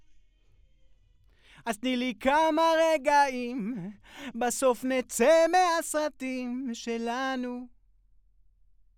VOX

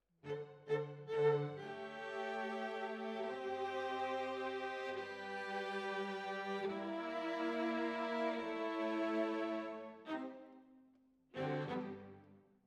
Violas, jazz for piano

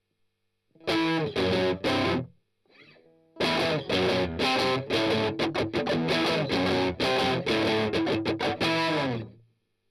Strum Octav

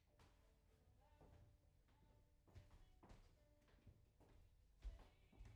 Piano Room

